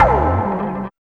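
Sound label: BASS LEAD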